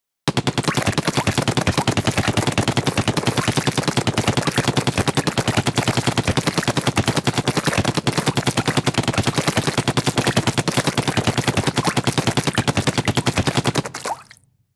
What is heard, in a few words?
SFX Splattershot